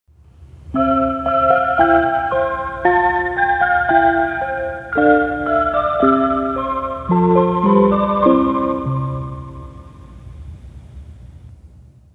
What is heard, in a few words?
chime